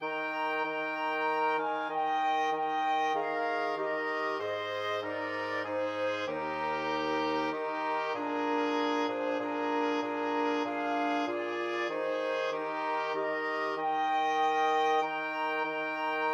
flexible mixed ensemble 4 players

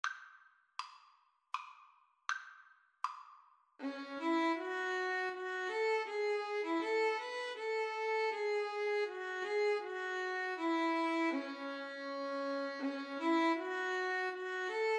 jupiter, Violin Cello Duet; holst

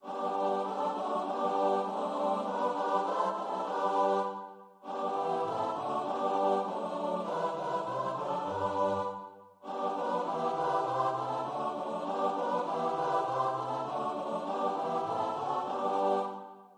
CHOIR

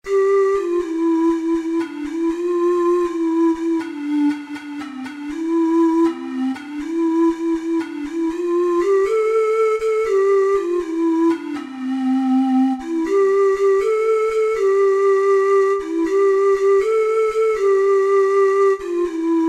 for hes a jolly good fellow, ocarina four hole

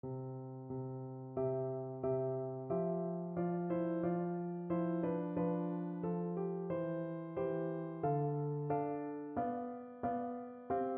recorder

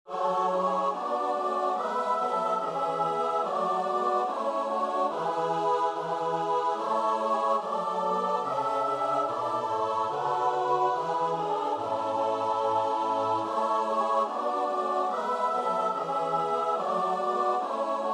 st matthew passion, choir; bach

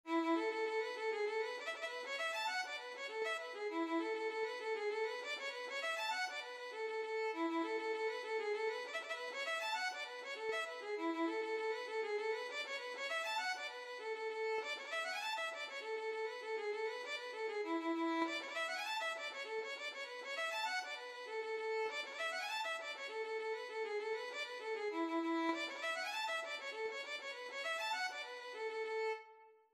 what you like violin

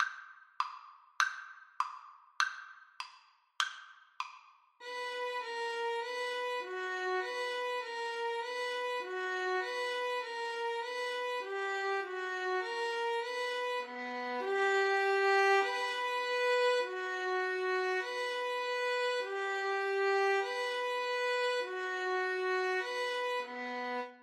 violin duet

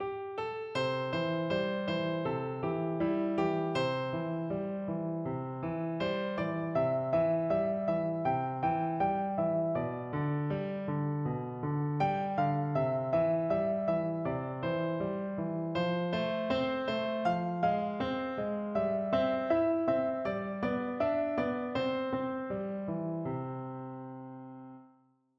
coming round the mountain, piano

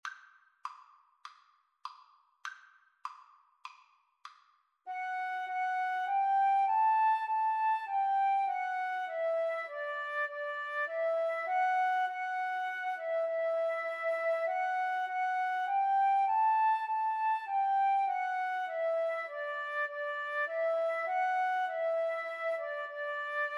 ode to joy, Flute Cello Duet; beethoven

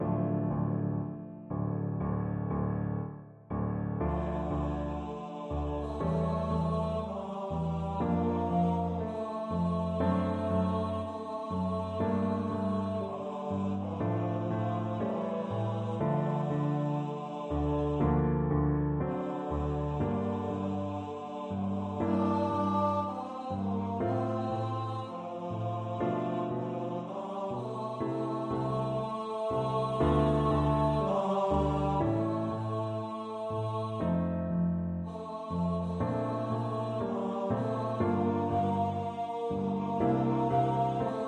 bass, baritone voice; faure libera me

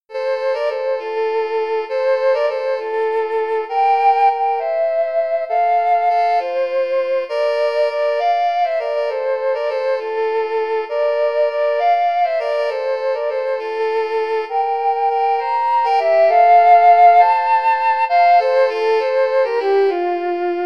flute sax, flute-saxophone duet

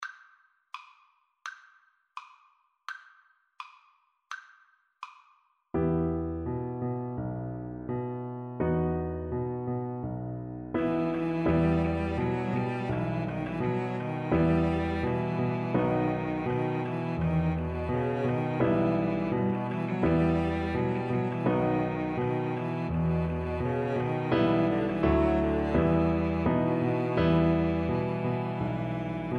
Piano Trio

